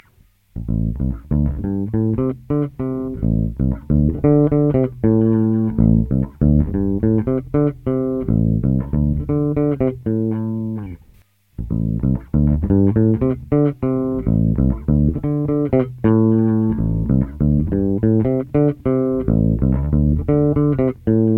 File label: comp pizz; ak-workshops